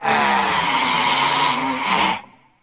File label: gorefast idle alerted; kf2; gorefiend; slender